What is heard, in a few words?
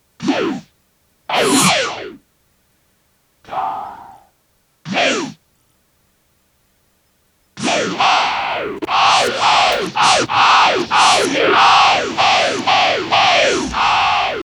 sci-fi sound of superhero